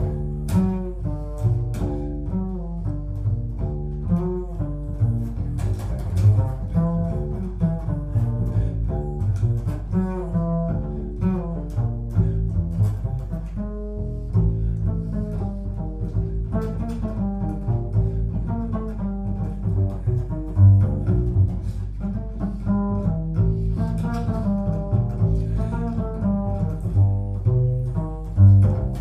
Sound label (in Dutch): contrabas stuk